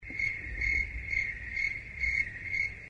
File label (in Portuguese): Grilo